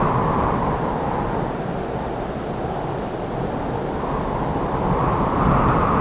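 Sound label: veter, wind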